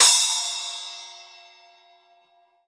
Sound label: Drums Acoustic, RAW KIT; USB Soundscan vol.10; AKAI; RAW KIT 6